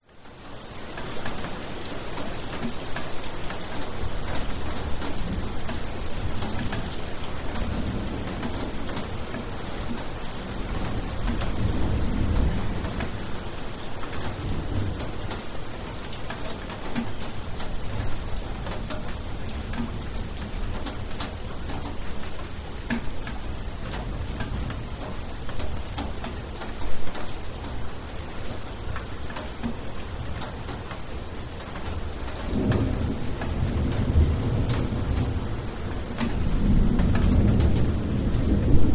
rain light on concrete tapping on things distant thunders and singing bali; indonesia; lukas tvrdon